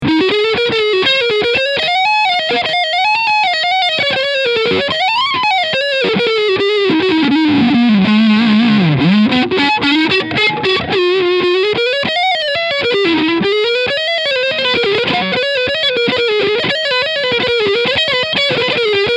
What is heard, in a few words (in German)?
Kramer Guitars Jersey Star E Gitarre Overdrive Leadsound Front Pickup